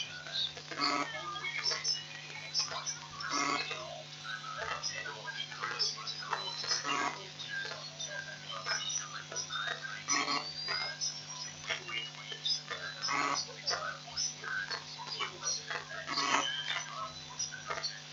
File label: recording radio station near router and cable